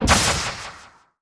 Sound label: multiplier Bump; starburstxxxtremeusa